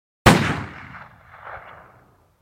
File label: Gunshot Echoing; UltimateFishing2020